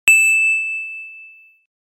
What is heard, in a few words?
Steel, Ringtones; Samsung SGH-F310 Serenata